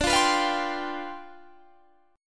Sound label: C Major 7th Suspended 2nd Suspended 4th D, chord